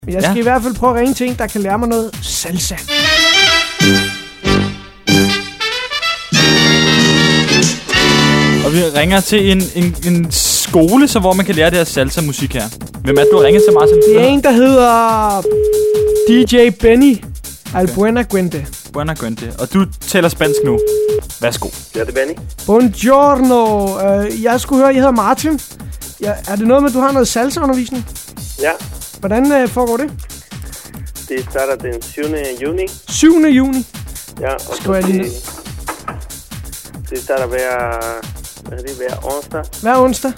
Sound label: Salsa